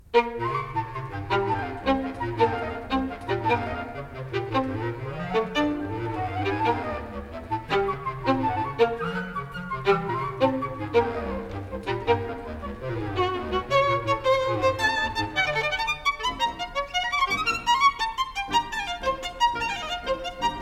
Violin Concerto No. 1 in A Minor Scherzo Allegro non troppo